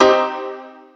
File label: trancey, synths; Notes